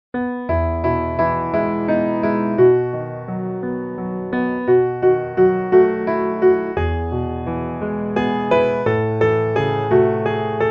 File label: piano